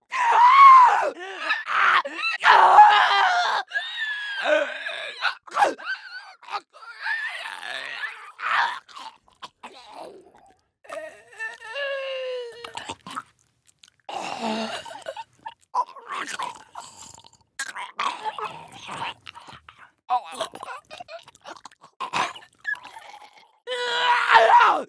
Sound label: female stalker; The Last of Us Part II